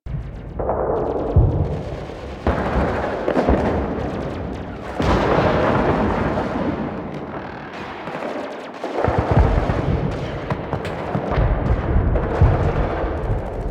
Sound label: Battle Ambience Loop; Warframe; The New War; Plains Of Eidolon; Mission One